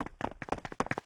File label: Run Go Two